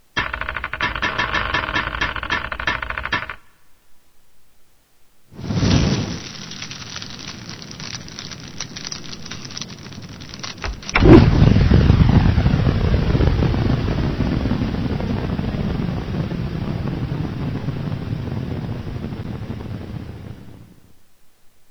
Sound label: Ignite Fire end